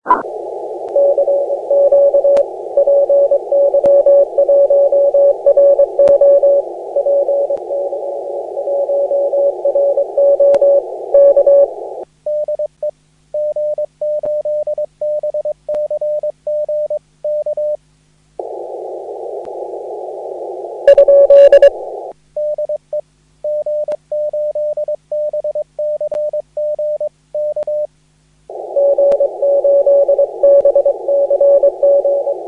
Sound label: cw